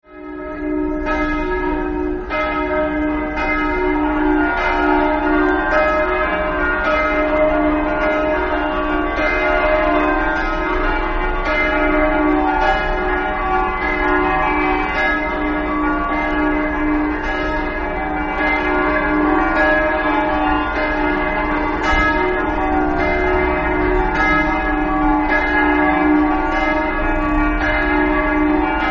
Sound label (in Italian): castelmonte, campane